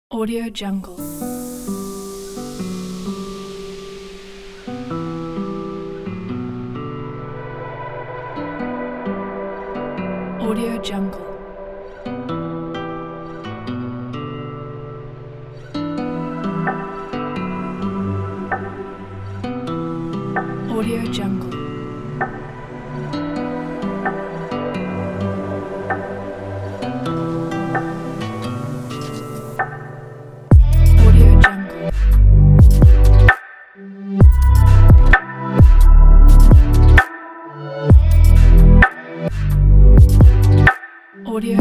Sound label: abstract background technology